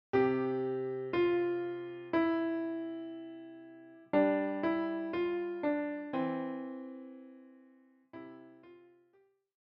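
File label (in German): Summ summ summ, Klavier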